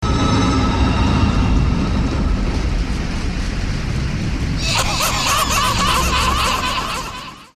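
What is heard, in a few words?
very scary sounds and screams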